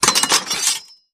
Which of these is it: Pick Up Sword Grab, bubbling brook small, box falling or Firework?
Pick Up Sword Grab